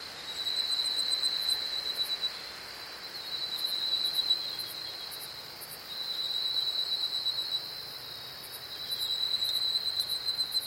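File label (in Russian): оживающий лес наполненный голосами различных птиц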